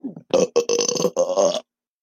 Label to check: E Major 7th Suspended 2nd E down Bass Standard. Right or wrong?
Wrong